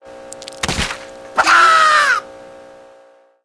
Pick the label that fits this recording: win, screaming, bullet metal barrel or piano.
win